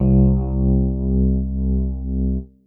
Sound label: Snoover C2